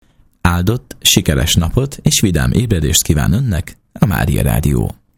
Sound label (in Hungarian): REGGELI JINGLE; szignalok; SZEKELYUDVARHELY